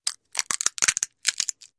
Nut Cracker Action, cooking & food

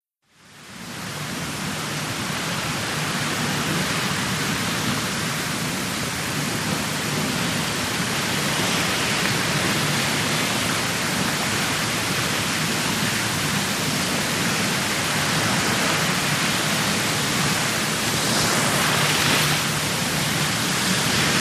Ocean Liner Bow Wash S, ships

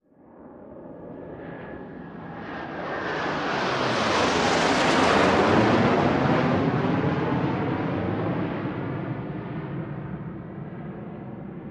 F/A-18 flyby

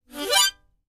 Mouth-organ, Short And Very Fast Glissade, Type 1; woodwinds harmonica

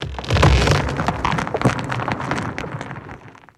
Earthquake Squeaky

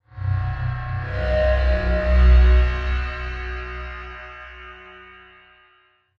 Logo Triple Elect Chi

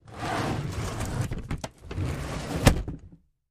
Slide On Floor, trunks

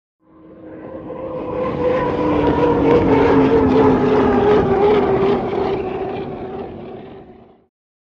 Hydroplane By Fast

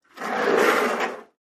door slide open; Sliding

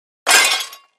Junk Pile Impact Medium

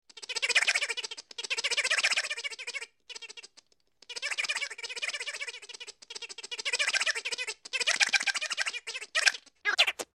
Weird Talking Device, cartoon gadget fx

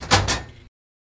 Latch Metal Unlock, push bar

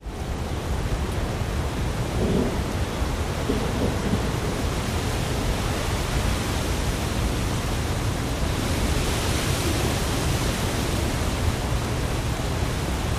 Quite Storm; storms

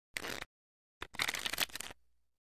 Cards Shuffle Deck; casino games

